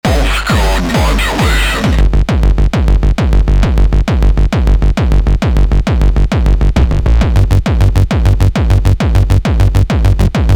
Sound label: pulse code modulation; weeklybeats 2024